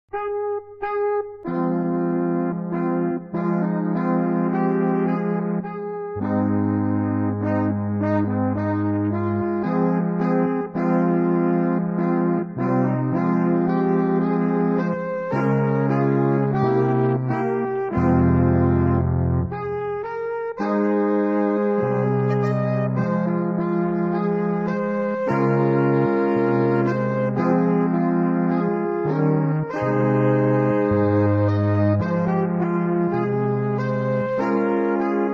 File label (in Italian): solo musica